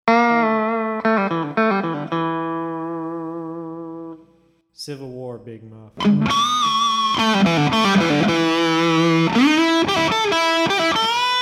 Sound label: EMG TONE BUILD SOLO; GE7